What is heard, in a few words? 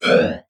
score slide in; tetrio